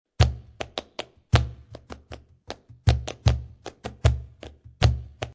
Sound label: SOLEA, flamenco